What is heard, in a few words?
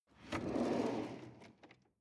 drawer medium open draw